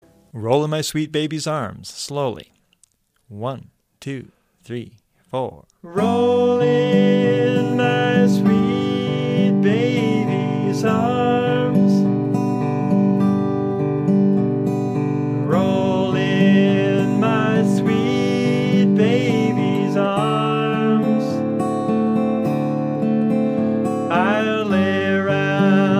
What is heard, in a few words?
travis picking